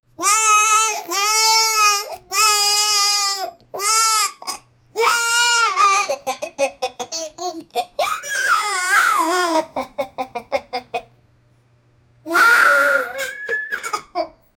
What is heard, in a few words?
a young boy, bathroom